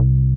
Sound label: BASS